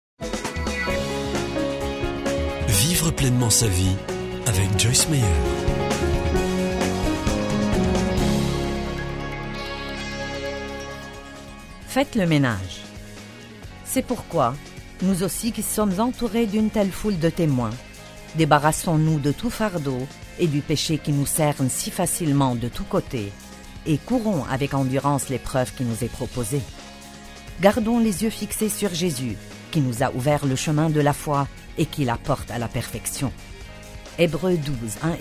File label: Music